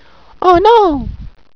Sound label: Error